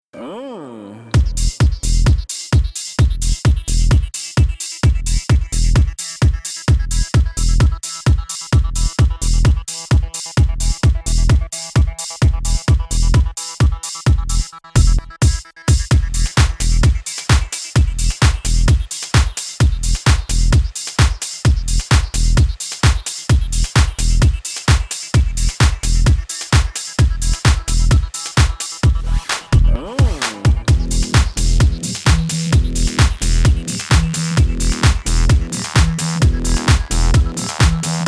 Dub Mix

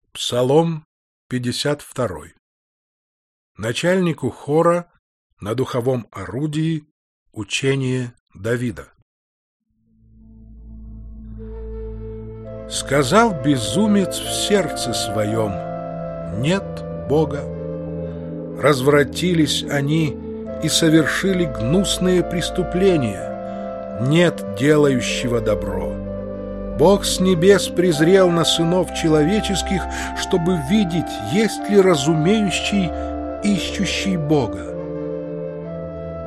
instrumental mix; ПСАЛТИРЬ, псалом 52, синодальный перевод

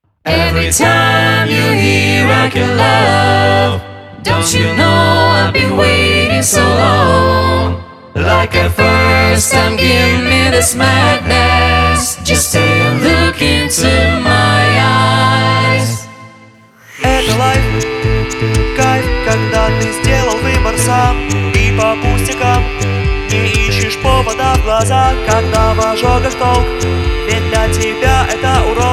Acapella Cover

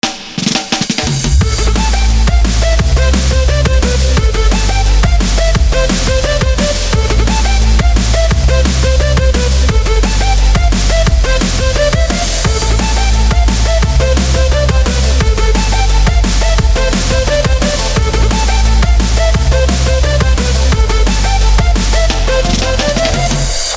dnb

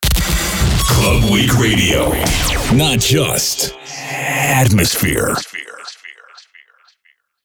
JINGLE; Club Week Radio Not Just Atmosphere

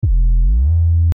Bass

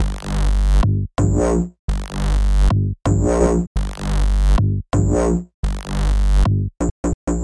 Bass

